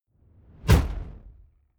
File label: sci-fi shot soft